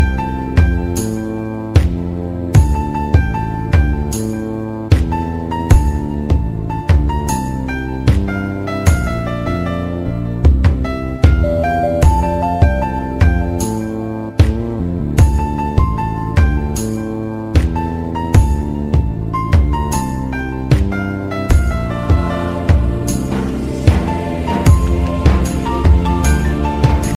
instrumental ringtone, bollywood ringtones

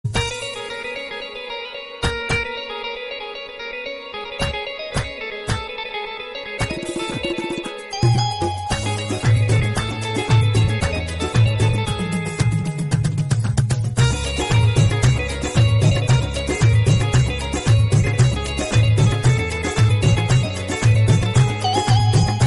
background music instrumental ringtone

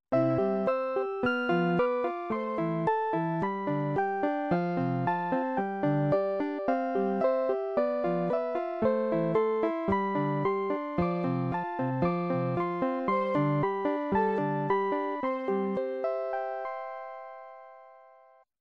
love ringtone

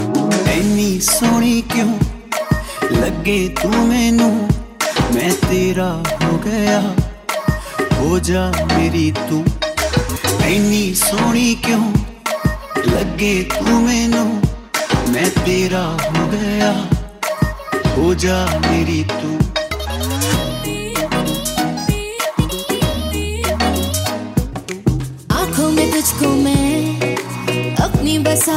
best sweet bollywood romantic ringtone

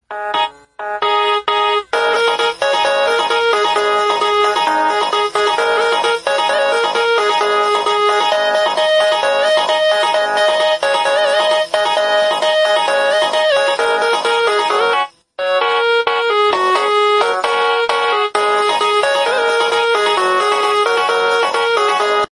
hindi instrumental song tone; bollywood

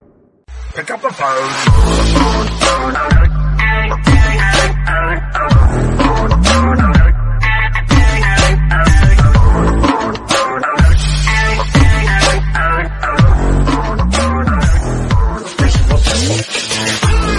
pick up the phone funny musical tone ringtone; iphone ringtones

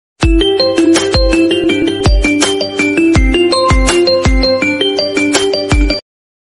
ringtone cool ringtones; galaxy ultra

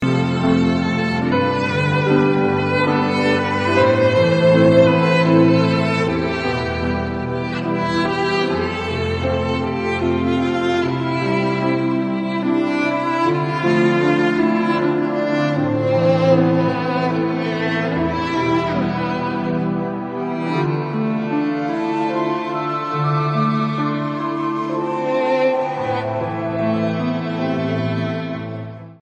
crying violin ringtone

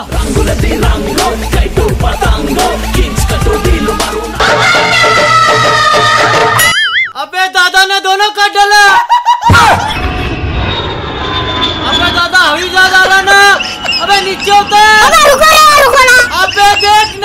cartoon ringtone

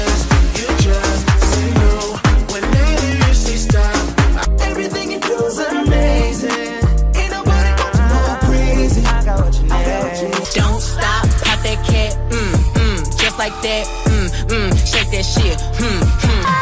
english ringtone english ringtones

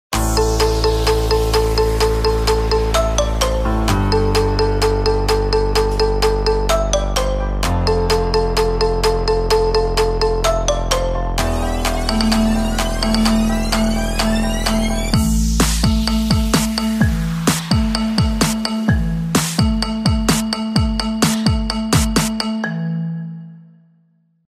ringtone, english ringtones

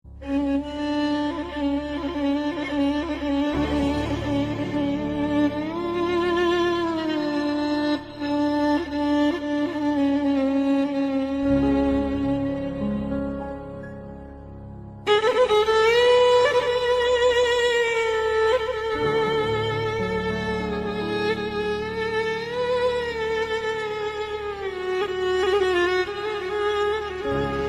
sad instrumental

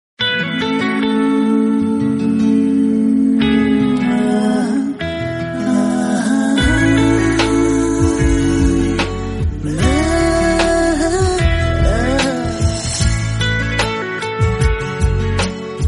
lovely romantic background musical tone ringtone

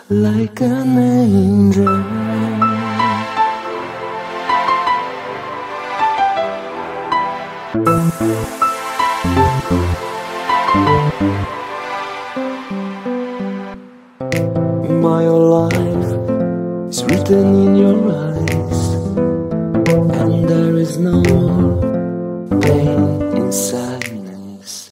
love english music ringtone; english ringtones

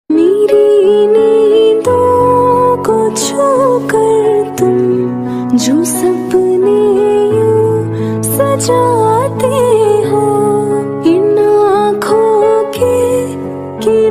latest guitar music ringtone, english

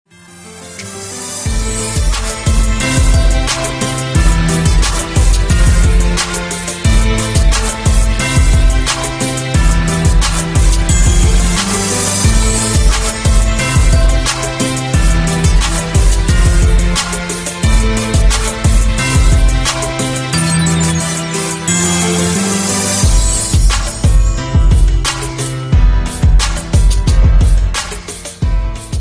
hip hop beat ringtone

